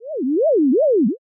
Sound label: flashback out